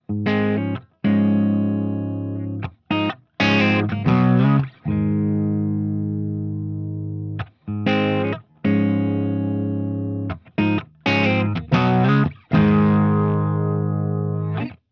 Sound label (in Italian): SB Les Paul Bridge Pickup